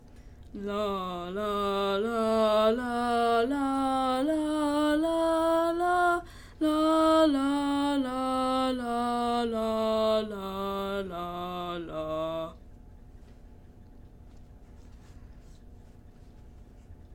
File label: Tenor; allstate2024 jrhigh; Audition